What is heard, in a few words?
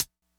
hihat; Pop; funkvol1